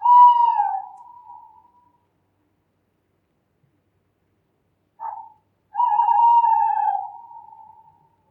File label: owl; DeadFright